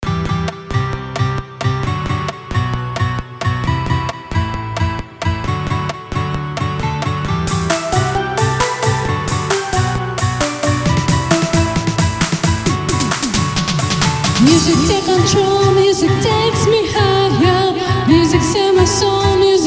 guitar

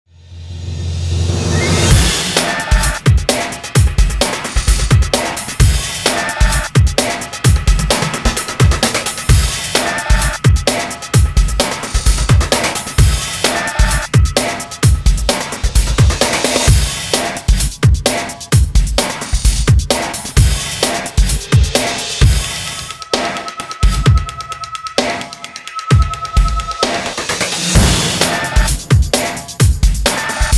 drums

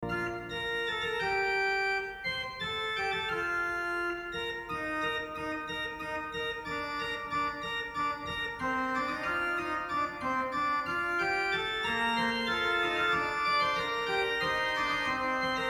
fugue in b flat major; johann sebastian bach; prelude and fugue; bwv 560

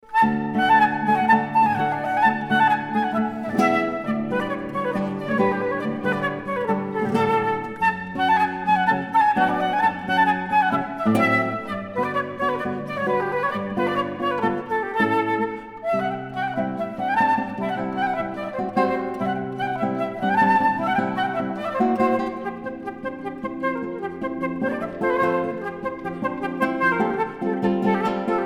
Romanian folk dances

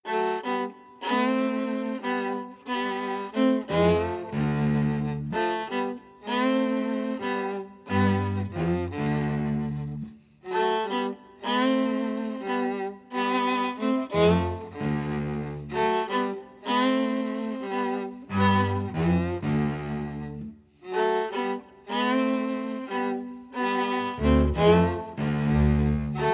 string quartet

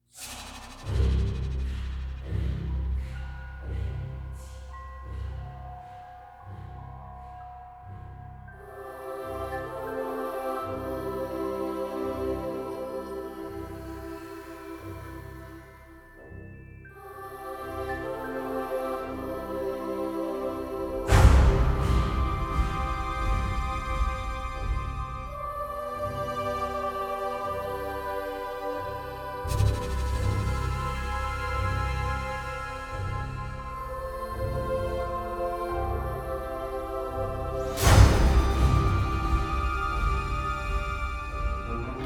silent night no sleigh bells

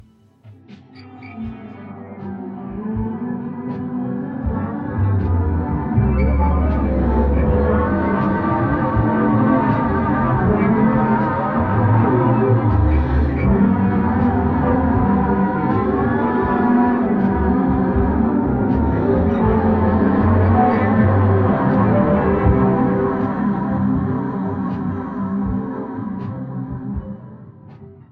humming interlude

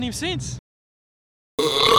burp

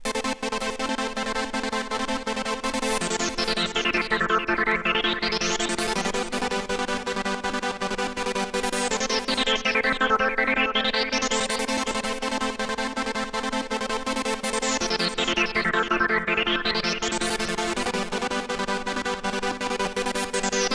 electronic version

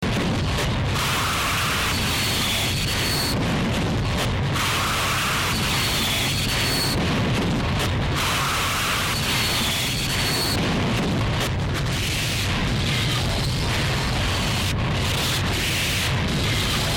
field recording industrial; agni hotra; asagaya

